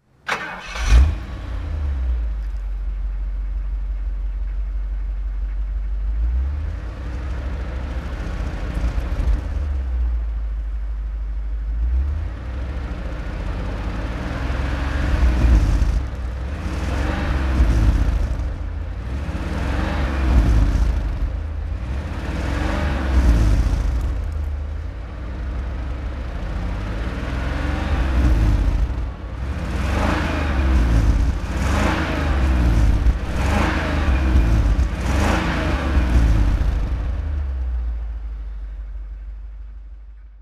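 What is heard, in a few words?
rolls royce corniche throttle, engine sound; Rolls Royce Corniche, 1982; V3D/ ClassicarImages; Marc Vorgers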